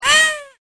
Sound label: baby cry